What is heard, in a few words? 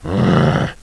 hit; brainbread